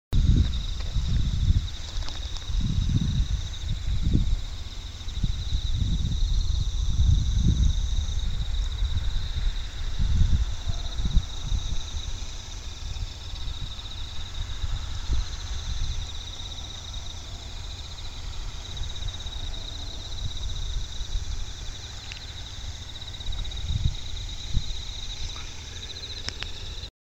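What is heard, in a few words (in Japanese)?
suzumushi, bell cricket; 花巻市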